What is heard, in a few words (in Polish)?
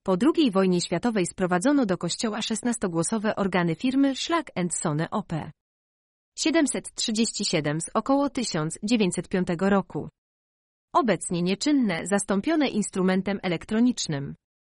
Organy